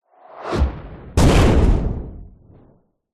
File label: whoosh approach hit metal hard whoosh tail; sound design